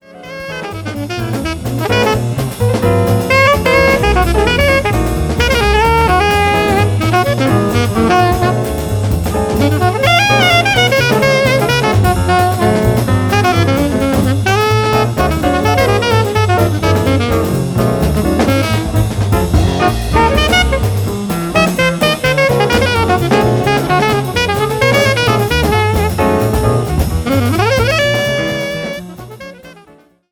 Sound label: jazz sax